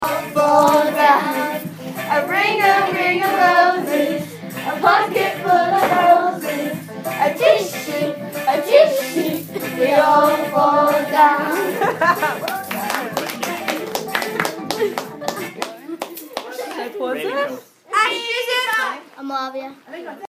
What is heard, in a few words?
ring a ring